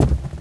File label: wood